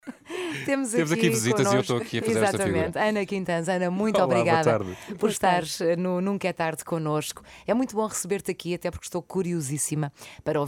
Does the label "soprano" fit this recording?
Yes